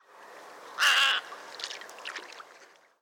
aigrette garzette

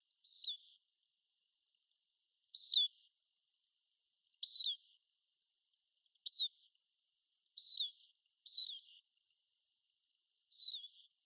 tarin des aulnes

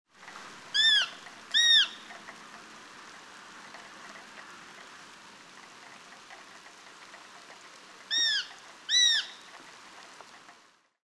epervier d europe

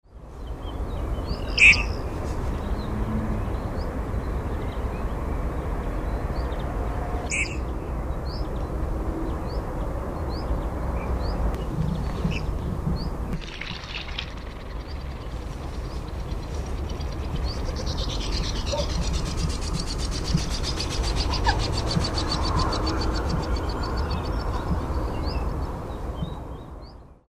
nette rousse

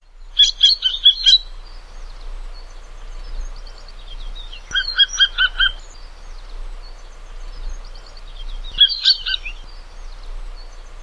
sittelle corse

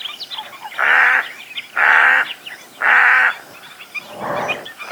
crabier chevelu

